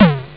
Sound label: c64 tom; ST-XX Amiga Soundtracker Sample Packs